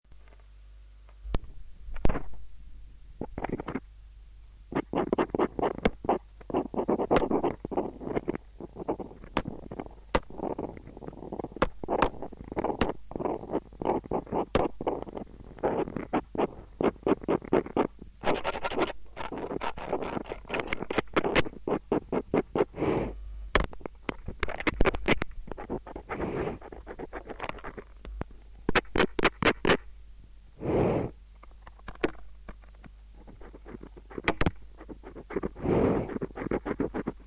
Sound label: voices noises